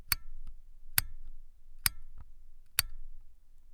ROULETTE PASM

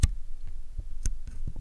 BOUTON DESACTIVATION AUTOFOCUS

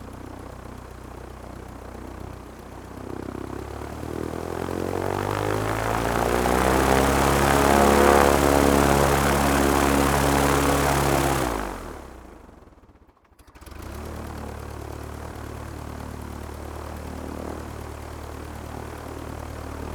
PLEIN GAZ

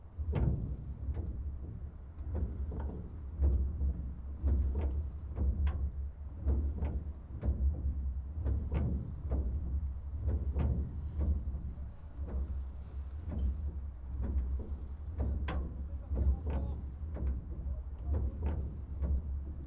AILERON

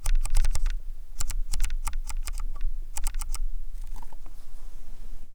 MOLETTE POUCE

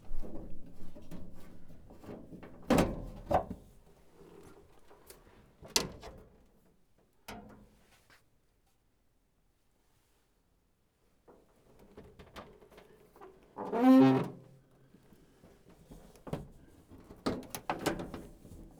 CAPOT OUVERTURE FERMETURE

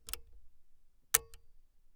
ARMEMENT DECLENCHEMENT RAPIDE KINAX JUNIOR

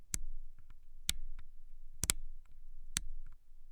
MODE AUTOFOCUS

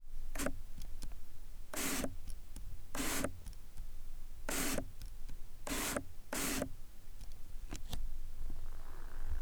AUTOFOCUS USM

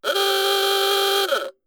KLAXON COURT

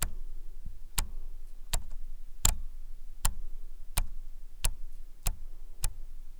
CHANGEMENT DIAPHRAGME

